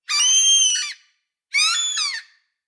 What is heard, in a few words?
Seagull call bird calling